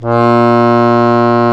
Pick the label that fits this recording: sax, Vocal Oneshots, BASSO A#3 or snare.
BASSO A#3